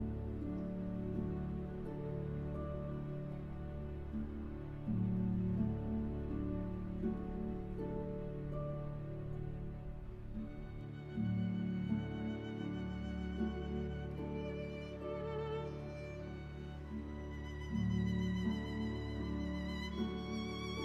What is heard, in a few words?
Meditation for violin and orchestra; Thais